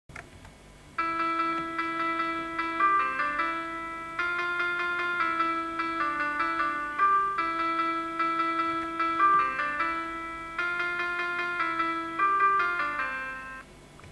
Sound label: jingle